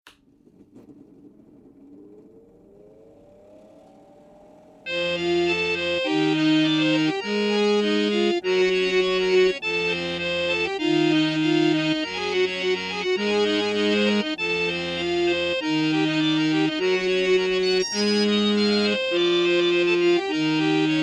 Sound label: wind organ